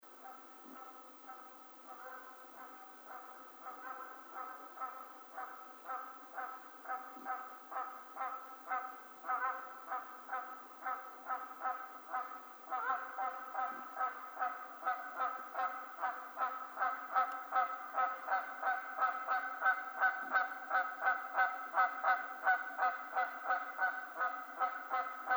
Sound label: Canada Goose